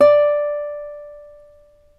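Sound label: D2 CLASSIC, CLASSICAL GUITAR; Northstar - New Gold VOL-2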